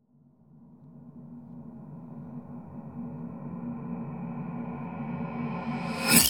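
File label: Reverse Swipe Strings, Cinematic Metal Impacts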